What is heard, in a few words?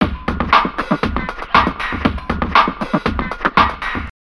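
Music Futz Loop; Transformers: Revenge of the Fallen; PS3; Windows; Xbox 360; Bumblebee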